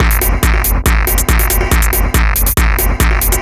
Dance Drumloop; Drums; Dance Beats

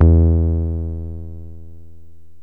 mono bass, yamaha rm1x synth bass and lead

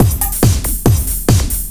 Dance Drumloop; Drums; Dance Beats

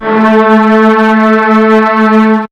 FAT BASS; SoundFactory

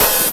Hi-Hat One Shot; Drums

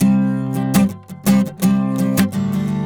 Acoustic Guitar; Guitars

